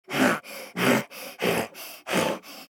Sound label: Boss Weak Breathing; Red Ball 4 Vol 1